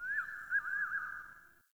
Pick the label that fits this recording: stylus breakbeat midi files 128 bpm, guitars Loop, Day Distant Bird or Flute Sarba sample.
Day Distant Bird